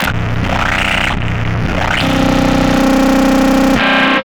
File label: Car Trash, Hard & Loud Techno